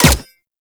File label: Energy Rifle Fire; Energy Rifle Vandal; Weapons